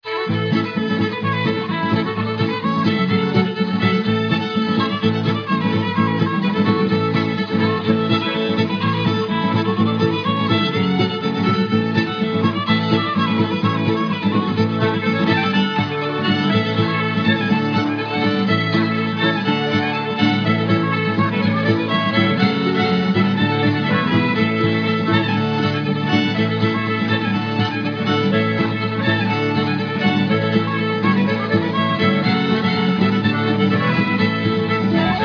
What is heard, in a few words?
cherokee shuffle